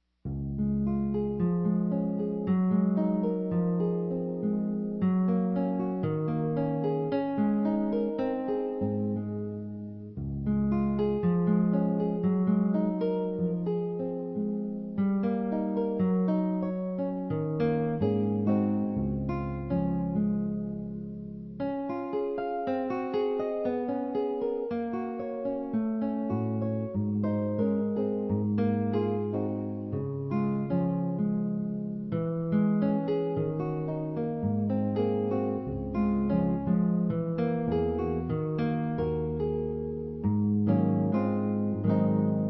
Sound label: Lullabye; Children's